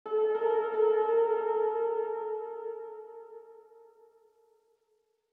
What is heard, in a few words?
Suspense Stinger, Classic Horror Sci-Fi Music; Suspense 3 - Stinger 2; Dead Ark